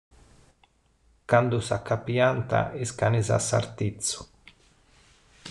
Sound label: Candu Acapiànt